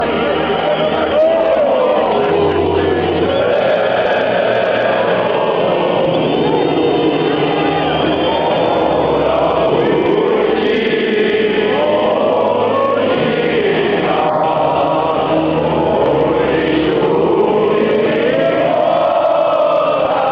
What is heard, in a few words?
czech republic national anthem fanchants